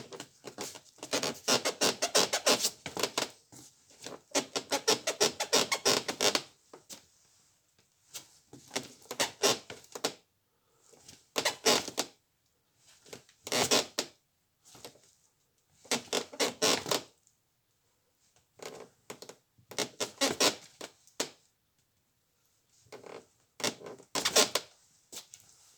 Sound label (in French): Bruitages bois escaliers grincements; Percu Bois